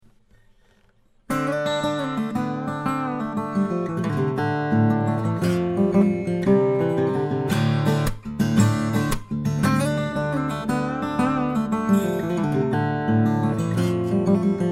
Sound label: galloup borealis, steel-string flattop guitar